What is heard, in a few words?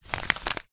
paper sheet fold; foley